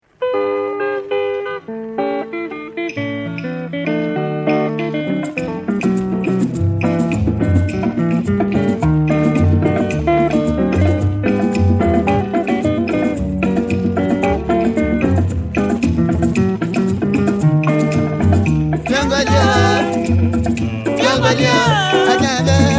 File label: palmwine music